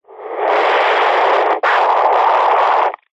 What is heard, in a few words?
technology cb radio wind blowing receiver speaker pov